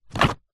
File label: office business cards in card box tip movement short